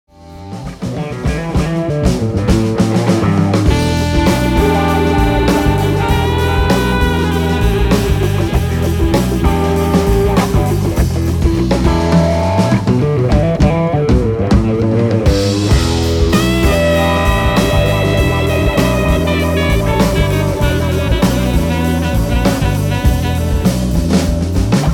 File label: Blues For Old Countrymen